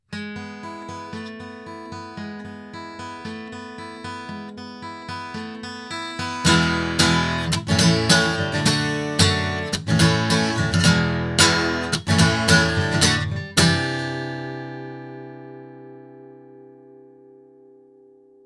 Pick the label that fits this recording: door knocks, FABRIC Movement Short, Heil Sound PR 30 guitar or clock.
Heil Sound PR 30 guitar